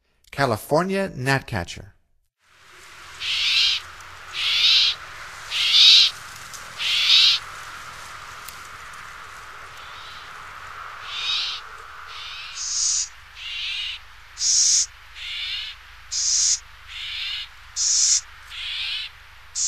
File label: California Gnatcatcher, Songs of Birds of North America; Stokes